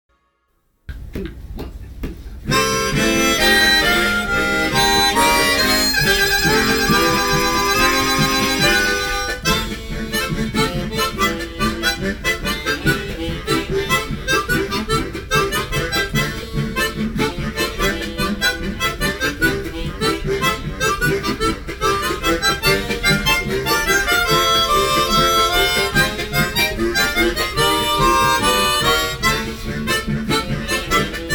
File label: harmonica; Doucette; Legacy audio (live performers)